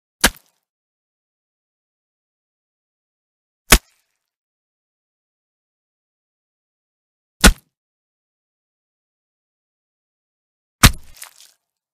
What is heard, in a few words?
axe chopping